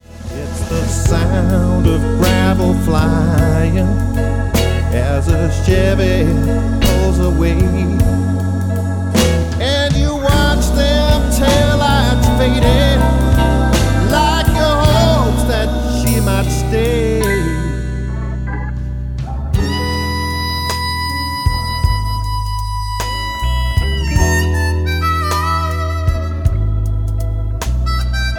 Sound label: Rhythm Of The Blues, harp